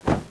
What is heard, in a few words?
ironfan slash, weapons